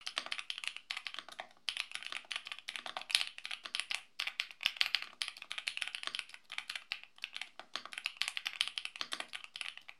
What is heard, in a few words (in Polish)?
QK81 dzwiek, klawiatura; Epomaker